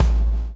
BLOW; Spectrasonics - Backbeat

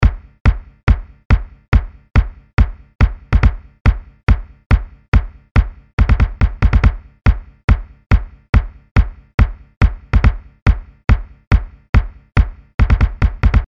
Basket Ball, Kicks; Industrial

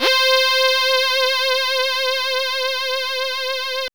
PAD R; AMG - Vince Clarke Lucky Bastard

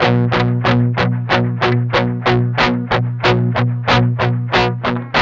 med rock 8th, guitars; Spectrasonics; Hans Zimmer Guitars VOL-1; 102-MED ROCK

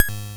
blips, opl3

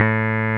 Roxichord, E.Piano

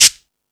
Percussion, Drum Machines

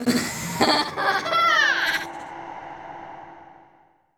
Mad Laugh; Total Drum&Bass

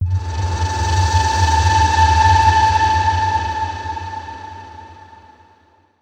atmo, FX; Ueberschall; Techno Trance Essentials

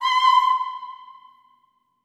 Staccato F C6 R, Symphony of Voices; Spectrasonics

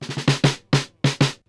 Fills, Monster Beats; Zero-G

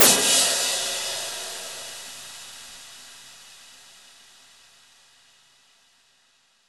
Crash, impact samples

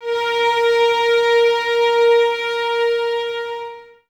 violins A#4, string ensembles; Miroslav Vitous

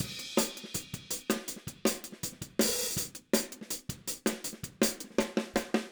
Fill Hi-Hat, Drums, 162 BPM; Drums from the Big Room The Mixes; Take Your Toms Off

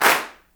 CLAPS DRY, HANDCLAPS; Spectrasonics; Heart of Africa

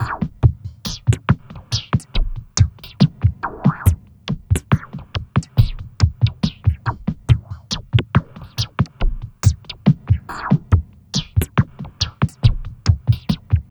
Filter Loop, Drums & Percussion, Electronica; Sonic Foundry (Sony Creative Software) - Electronic Point Blank

